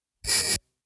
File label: video static distortion short bursts; rizzy; prosoundfx